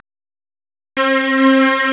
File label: STRNG; CMP - Analogon